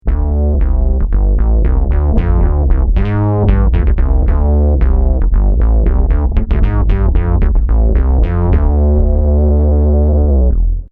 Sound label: v bass synth bass